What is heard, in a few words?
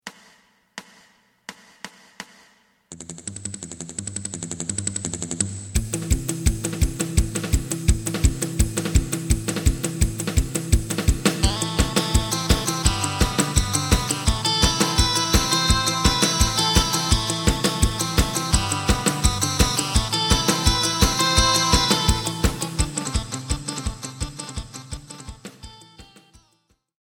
bass